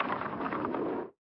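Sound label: Ball Roll, pinball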